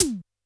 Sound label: D3 - E Tom, Yamaha PSR-E323 Electronic Kit